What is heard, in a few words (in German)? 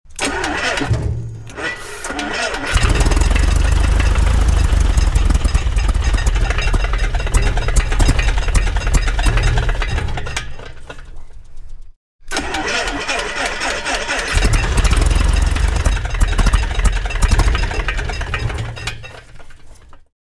flugzeugmotor starten; waveland tonstudio